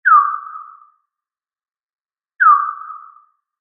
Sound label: Drop, ringtones